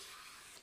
peel pumpkin

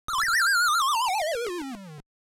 warlock falling; 8-bit Platformer SFX

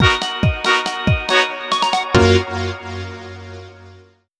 jingle

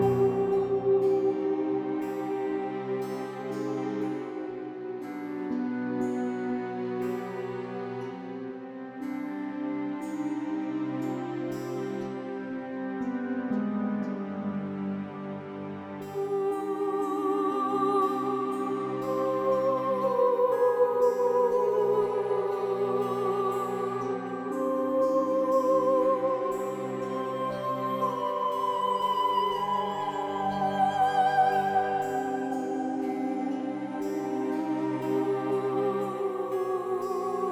moonlit lullaby for the lost loop

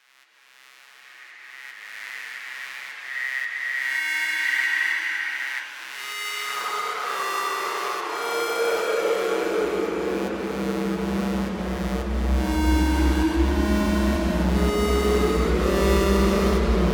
Creepy Ambience

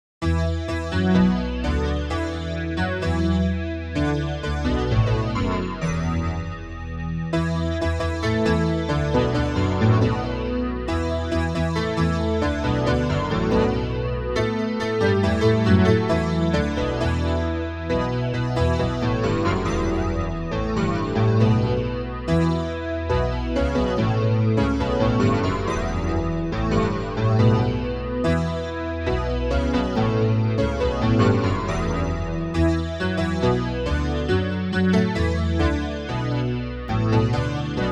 battle

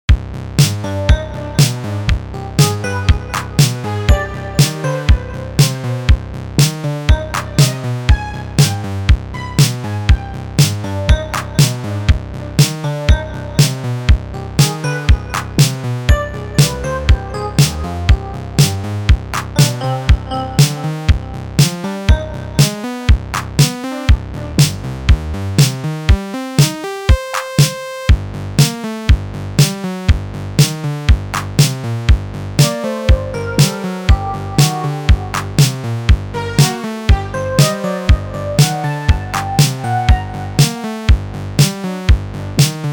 synth wave

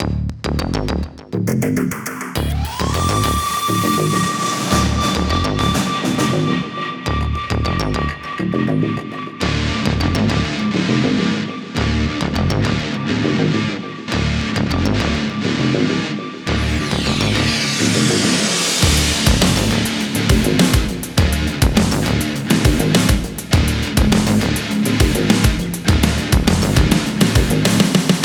Music in the spirit of old-school RTS

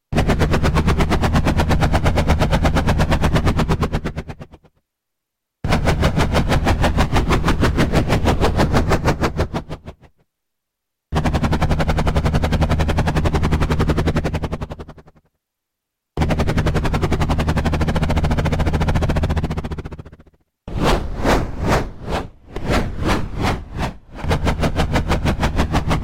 helicopter

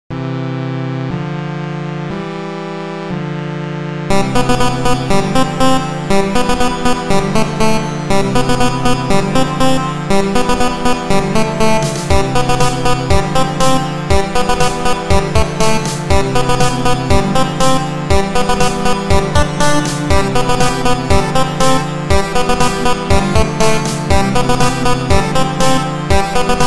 digital city, chiptune; chipville

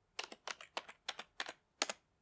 keyboard typing

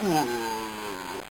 enemy death, bitcrushed voice one shots

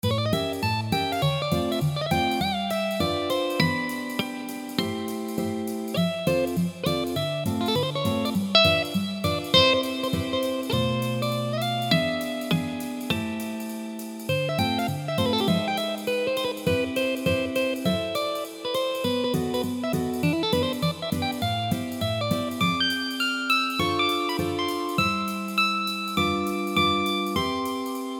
taking you to the circus guitar

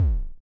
boss damaged

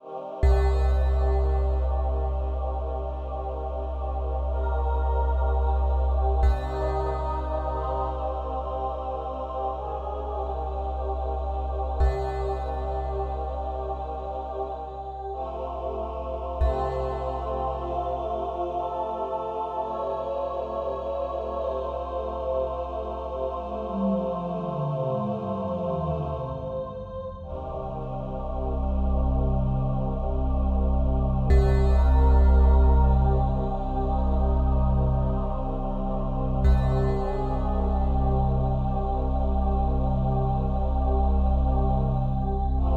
Dark Shrine Loop; qubodup; OpenGameArt

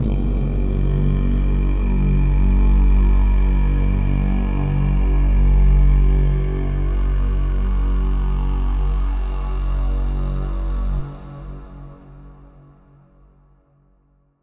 Scary Sound

